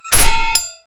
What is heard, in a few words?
attack